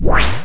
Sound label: full line; Game Zone; YOURTRIS